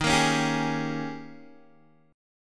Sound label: D sharp 11th D sharp, Guitar, Open G